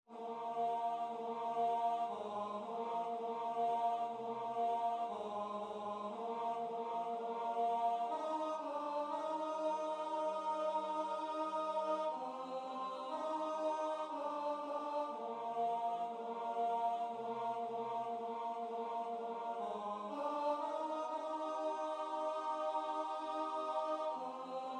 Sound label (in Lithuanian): sia naktele tenor